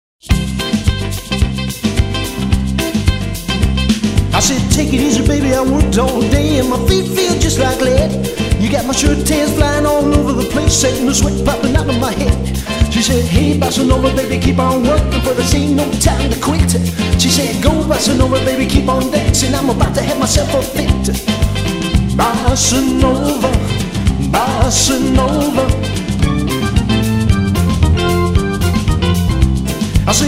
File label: Bossa Nova